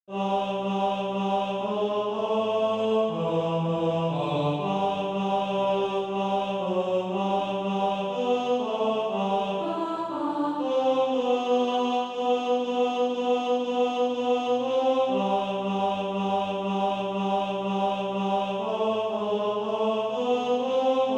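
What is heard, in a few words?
tenor, Practice Music; A Cry as of pain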